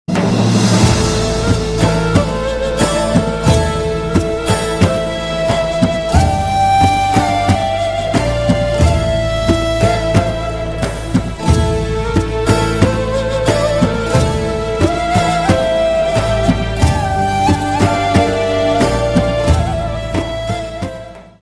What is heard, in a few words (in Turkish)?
fon muzigi; yunus emre askin yolculugu